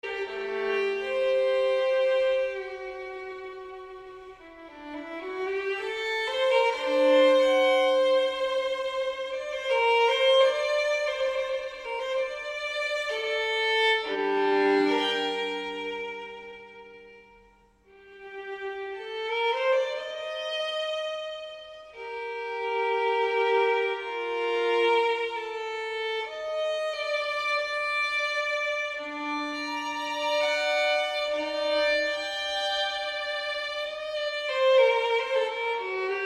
Violin Sonata in G Minor Adagio

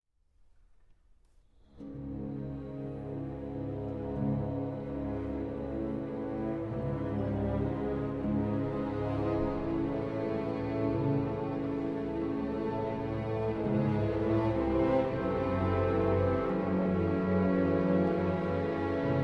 Suite For Clarinet & Orchestra

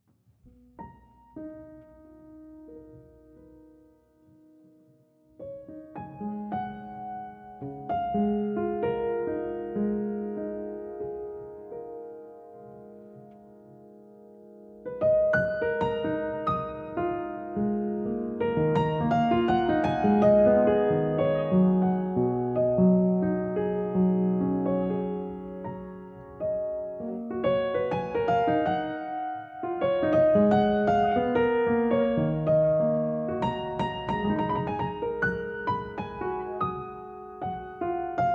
Improvisation

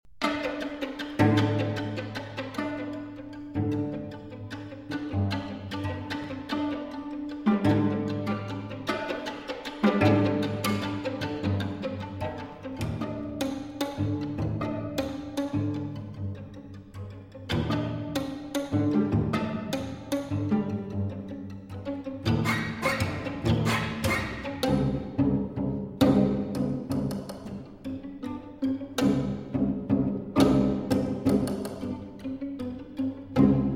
String Quartet, Risoluto

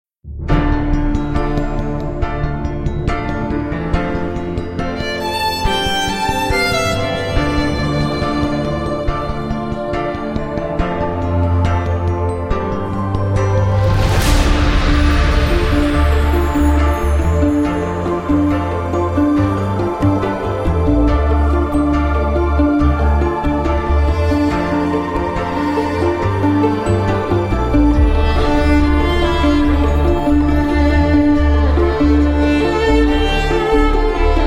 Orchestral Version

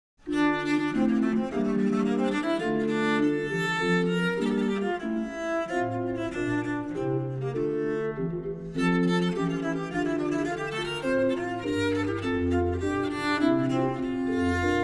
Sonata in D Minor For Viola da Gamba Allegro

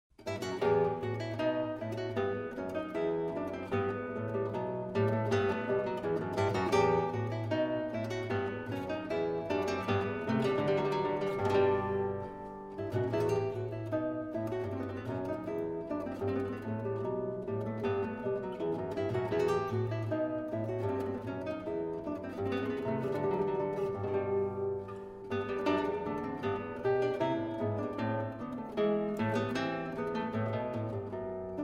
Suite in E Minor, Bourrée; BWV 996

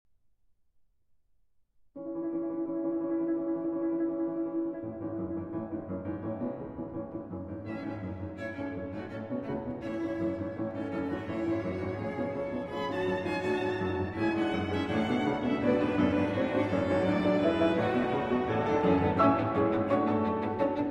Piano Trio in A Minor, Allegro con brio; Op. 150 III.

